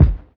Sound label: smooth kick drum sound G key